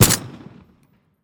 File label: silenced; teardown ps5 windows xbox series xs gamerip 2022